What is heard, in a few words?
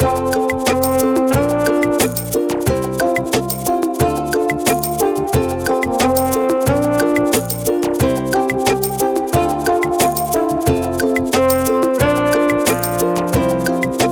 Ukulele Menu Loop; Human Rocket Person; switch windows gamerip 2018